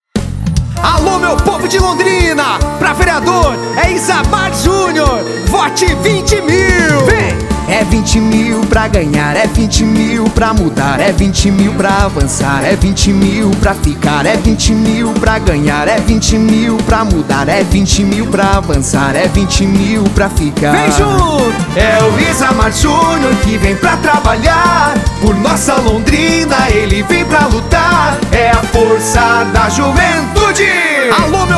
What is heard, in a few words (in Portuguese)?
Jingle VEREADOR; Pra mudar e avancar; RENOVACAO